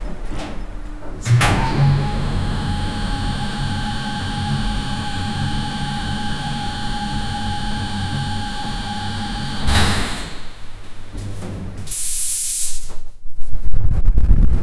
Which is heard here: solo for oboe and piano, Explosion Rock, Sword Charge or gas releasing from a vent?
gas releasing from a vent